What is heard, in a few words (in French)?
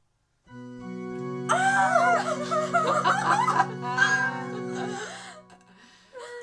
orgue raté